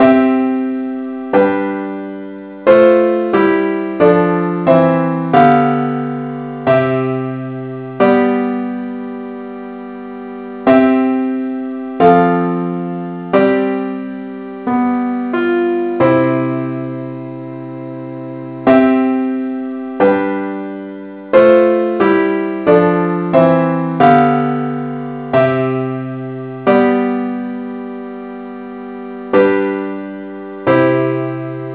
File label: parts only key C; Gwalchmai; Glory be to God on high